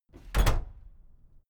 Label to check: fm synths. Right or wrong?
Wrong